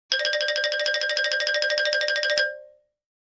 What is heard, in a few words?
Funny xylophone cartoon running sound effect low